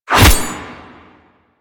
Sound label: Machete Whoosh; Warframe; Weapons; Melee; KahlMachete